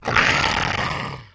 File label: Leopard Breath Snort; big cats